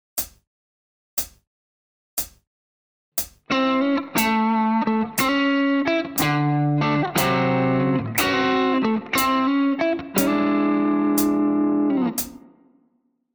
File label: lick